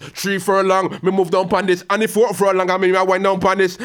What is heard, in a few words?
G-PHRASE, RAGGA IN G